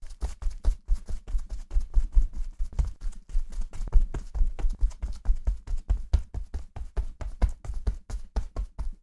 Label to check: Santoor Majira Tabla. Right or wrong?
Wrong